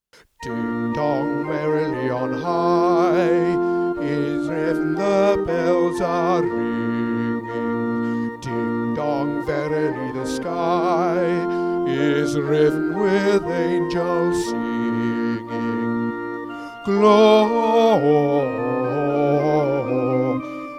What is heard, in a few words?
Bass; Ding Dong Merrily